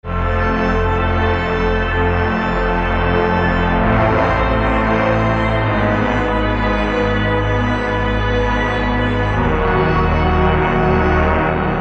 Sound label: Tape; Heavyocity Mosaic